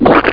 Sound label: Snail; Yipe! III; Monsters